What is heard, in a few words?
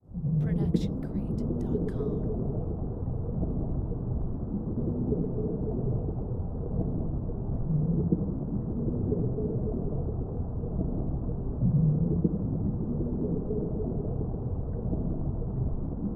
underwater ambience; soundscrate